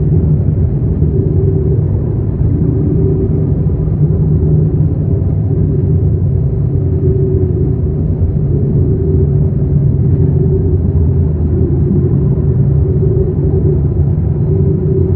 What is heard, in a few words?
ambient battleship hum; Stellaris